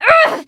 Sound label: VO Inquisitor Wound; battlerite